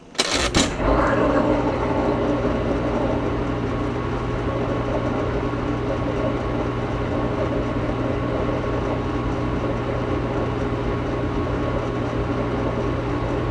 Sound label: dishwasher